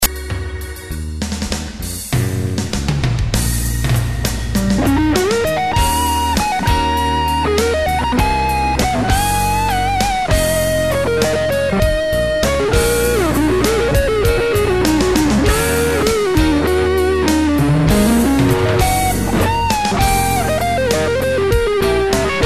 solo; Solo de Manhattan

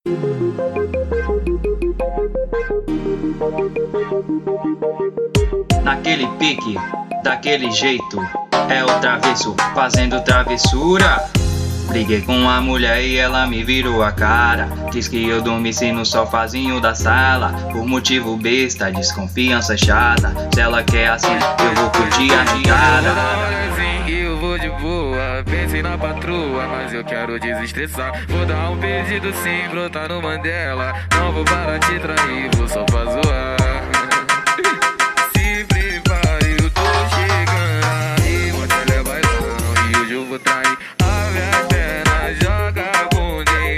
brega funk

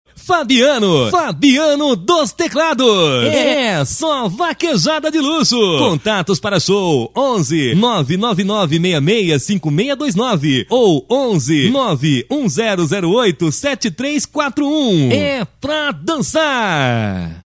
vinheta, teclados

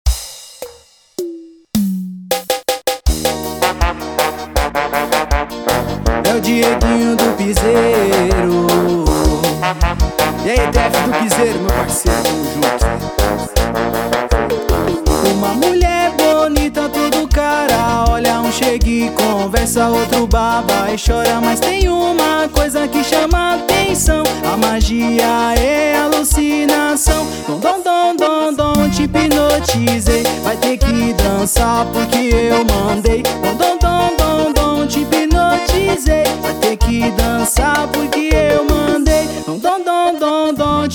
piseiro

